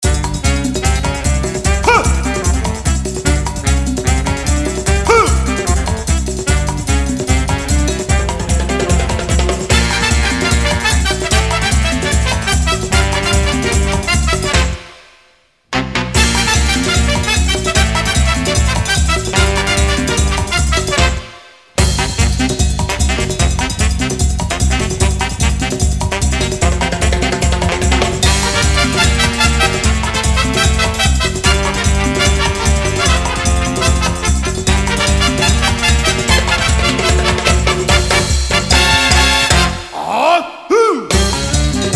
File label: Mambo Beat; Samba de Amigo; Dreamcast